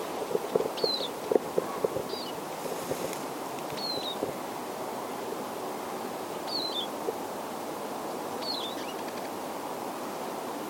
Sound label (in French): chardonnerets elegants